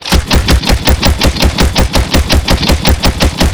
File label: automatic MK 19, weapons; DCS